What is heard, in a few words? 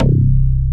DX 7, Synth Bass; SYNTH-Basses; Sound & Vision; Gigapack I CD 2